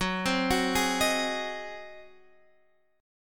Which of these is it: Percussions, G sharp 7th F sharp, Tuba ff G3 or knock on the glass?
G sharp 7th F sharp